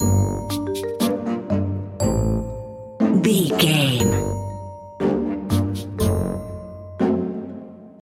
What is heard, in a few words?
sneaky tiptoeing loop